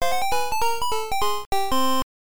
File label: Game Over MUSIC; Dig Dug; NES